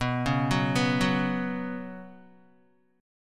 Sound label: B Suspended 2nd B down Guitar, Bsus2 chord; arpeggiated; JGuitar